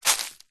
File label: foley footstep single soft shoe dry leaves forest floor; zapsplat